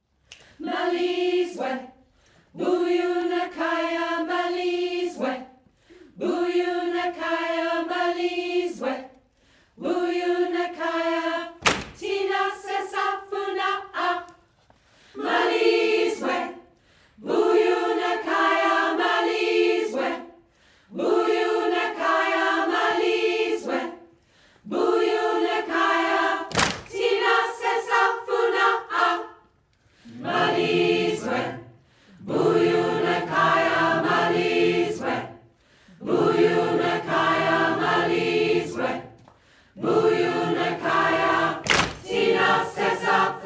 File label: community choir; bellacapella; maliswe; creative west sussex